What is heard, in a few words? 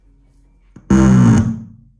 fart